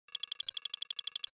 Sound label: beeping sound